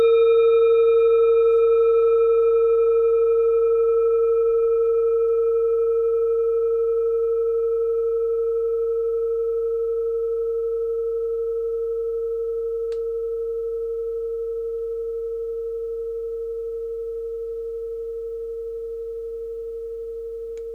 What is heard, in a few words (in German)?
kleine klangschale